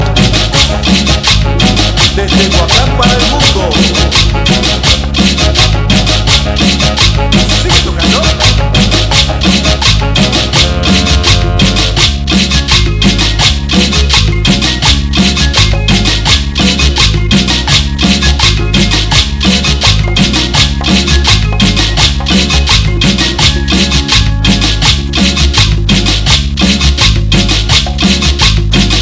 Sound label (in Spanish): cumbia; latino